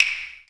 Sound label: CLACK, JAPANESE